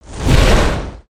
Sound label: Flap Fire Fire, creatures organic; Bruno